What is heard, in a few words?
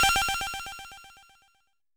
TEK BUBBLES, TECHNO; Drums In Your FACE!